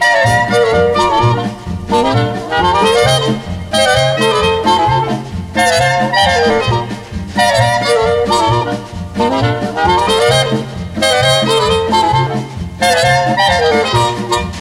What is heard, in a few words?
Retro Hat Dance